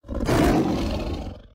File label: smilodon idle; paleotest